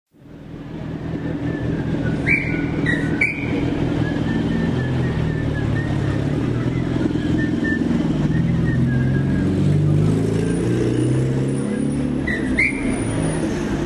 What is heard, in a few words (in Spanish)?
calles silbato inspector; p cordoba y sarmiento